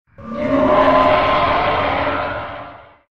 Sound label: Hollow Cry